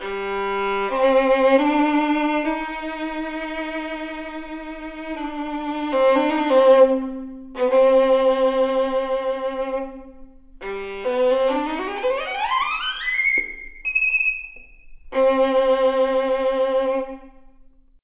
violin

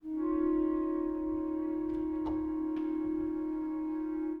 clar flute

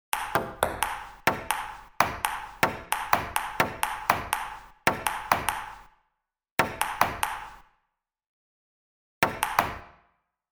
real ping pong

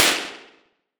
lobby modeled; ccrma

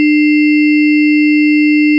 fm timbre

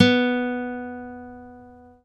guitar

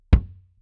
kick; dsp2008 sound library

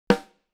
snare

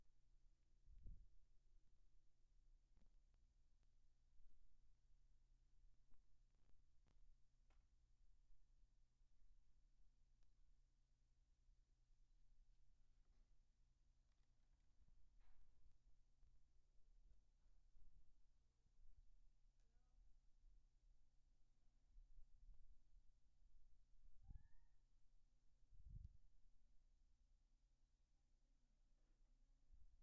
bass

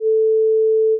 sine

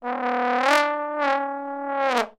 trombone flutter; dsp2008 sound library; sdif tests

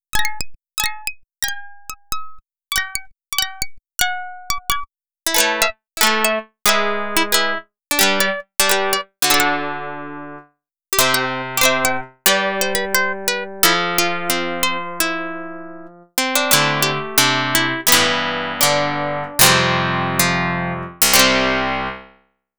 plick pluck

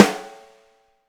snare

